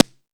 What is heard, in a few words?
Rock N Roll, Drum Machines